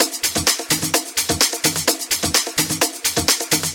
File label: Percussive Loops 128BPM; Vengeance Samples